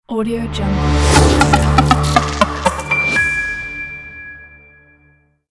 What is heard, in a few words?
abstract tech logo